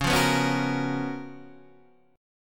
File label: C sharp 13th C sharp, Guitar, Standard; strummed; C#13; JGuitar